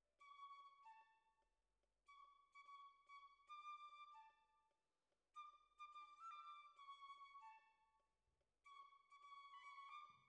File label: Violas, jazz for piano